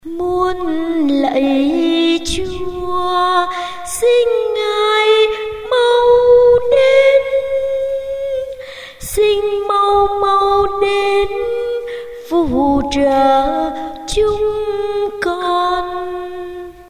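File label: soprano tenor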